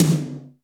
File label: AMB T, HALL KITS; Crypton - DrumBase5000